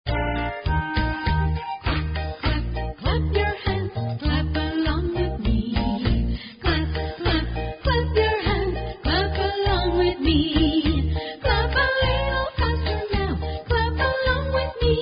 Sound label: Clap Along